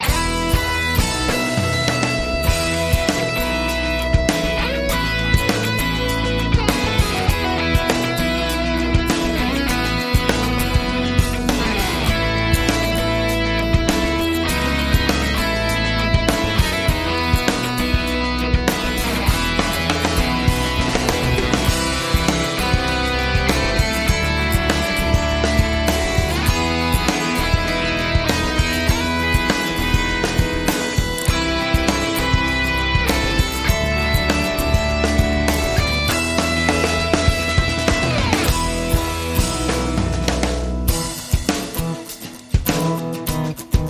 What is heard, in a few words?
World View Promo